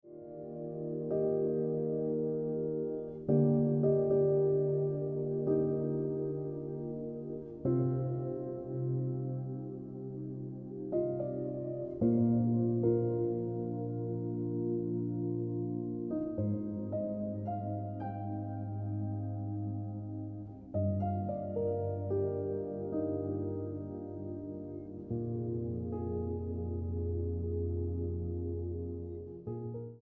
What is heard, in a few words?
Piano Music